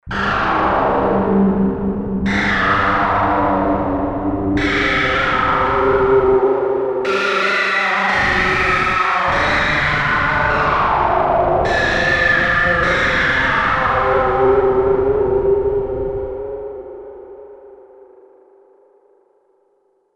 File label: Alien Rain